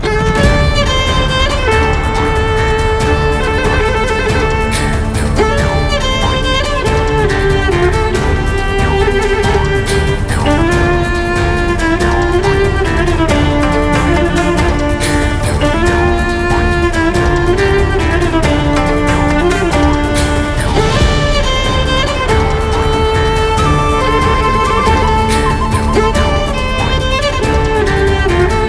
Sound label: Twilight Gallop loop, BGM